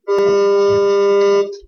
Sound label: School Bell